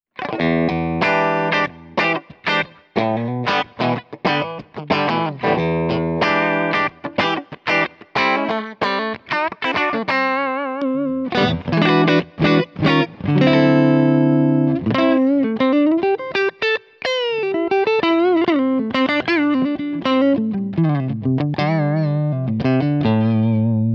Fulltone Queen Bee Fuzz Clean Referenz

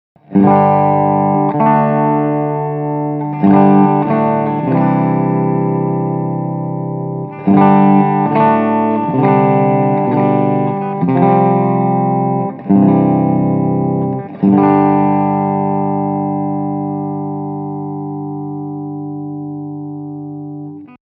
düsenberg Steg clean

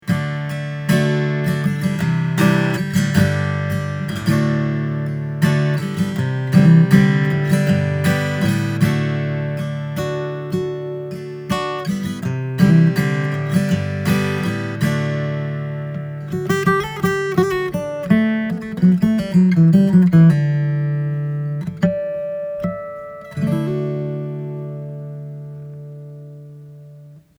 Alvarez MGA66 ESHB UST

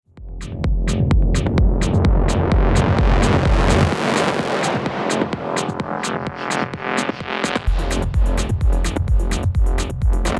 Denon DJ X1850 Pumper

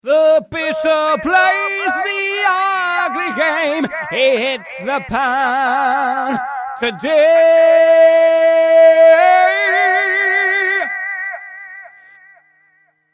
Echophone

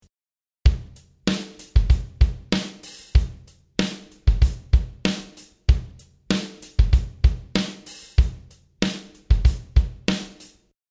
Loop + Urei

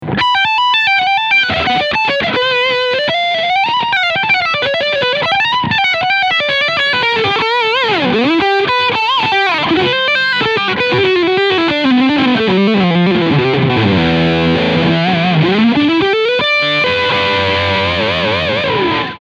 Ibanez RG657 Leadsound Steg Pickup

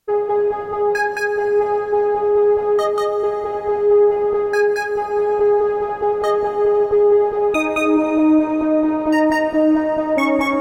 Korg Prologue

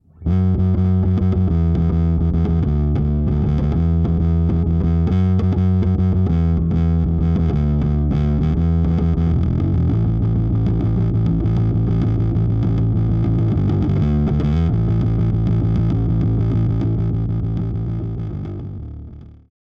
Nux Bassman

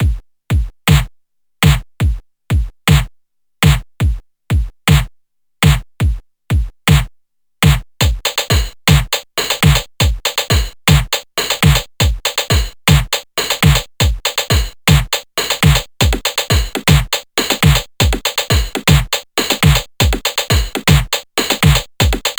Soulsby Oscidrum Drum Set

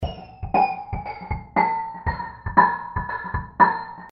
Loop Vocal Sweep

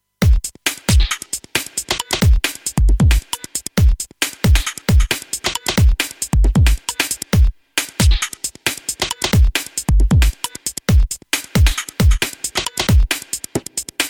Beat EQ

